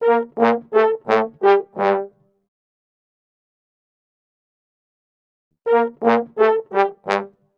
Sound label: 85 g min brasses; PowerFX - Downtown Orchestra